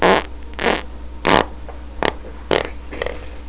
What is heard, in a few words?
fart